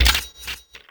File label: Activate; Unending Dusk